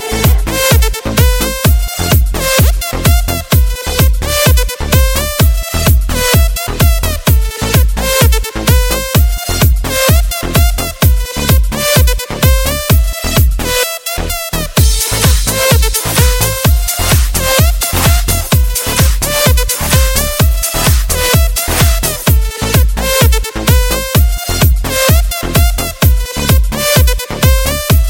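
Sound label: jingle; Otbivka Veduschie MiM 2014; отбивки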